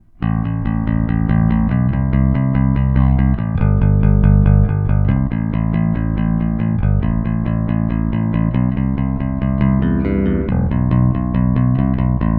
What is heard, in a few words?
Midcut Pick